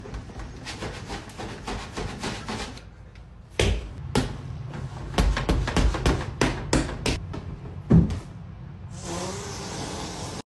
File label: boxing man mannequin; girl in mask; audio meme